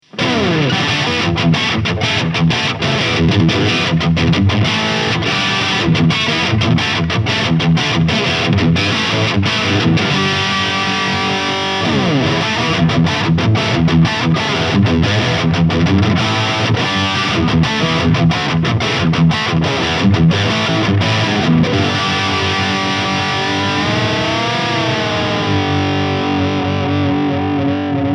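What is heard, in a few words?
ESP LTD Eclipse Lead, guitar; Rainbow CRK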